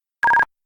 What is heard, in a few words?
FleetSync Alert